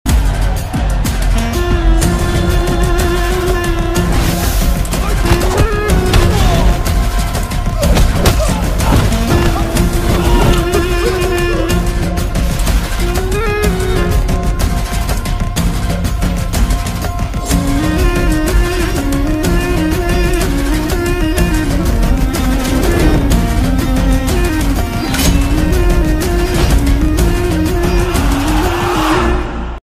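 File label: villain background music ringtone; bhaira; devara glimpse; saif ali khan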